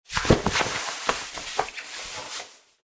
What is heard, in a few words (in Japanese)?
服を脱ぐ音